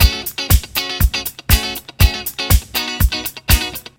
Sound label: Disco Beat Guitar